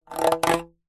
foley plastic flexi object poke into plastic tube scrape recorded other end